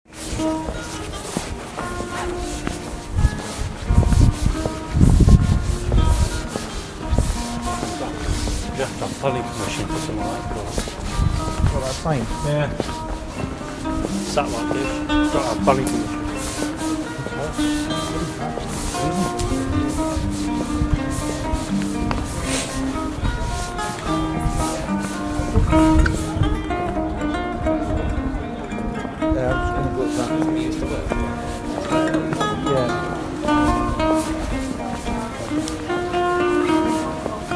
soundscape, A soundscape as a colleague and I walk; Lincoln; some expletives